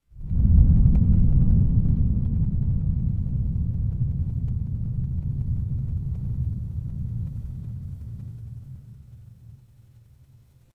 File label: ambience wind